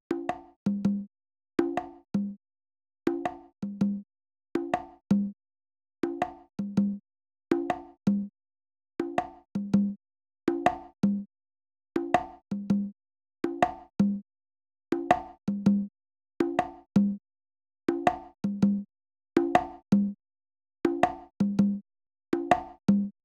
drum tracks bongo loop, Smooth RnB 081 Cmin

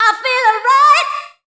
Dance Vocals, FEMALE

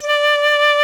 D3 PICCOLO; Northstar; New Gold VOL-2

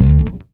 bass 4 (D) short slide; Sonic Foundry (Sony Creative Software); Ugly Remnants VOL-2; Basstuff

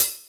Open Hhat, Hihat; Equipped Music; Loungin House

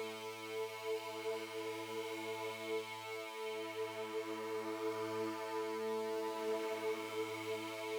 Drone; stereo toolkit samples; Non Tempo Loops

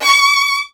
Virtuoso Strings C#3; ILIO; Kirk Hunter Virtuoso Strings VOL-1

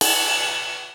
Yamaha RX-5, drums perc

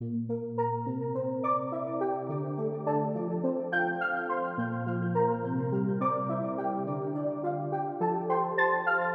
Fmaj 105BPM Pluck; 99Sounds; Monosounds; Music Loops; PANDORA BOX!; Smoking Hot

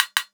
SN, drum machines

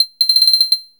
guiro, synth guiros; Zero-G Ambient 1

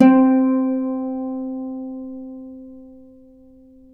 Celtic Harp, Plucked Strings

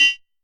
CR78 Beat, Roland SH-32 Drumset

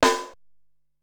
Rim Shot Snare, Drum Machines Ensoniq SQ-R-Plus; 300 Drum Machines

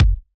acid jazz kit kick

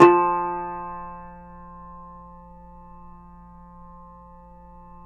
YAYLI TAMBUR F; Spectrasonics; Hans Zimmer Guitars VOL-2

